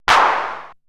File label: drum g5, drum computer, 80s drum set; Best Service ProSamples vol.55; Retro Sampler